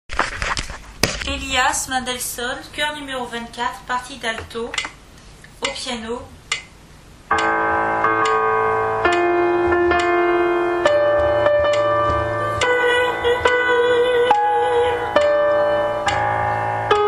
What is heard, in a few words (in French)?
piano lent